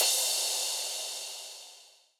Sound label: crash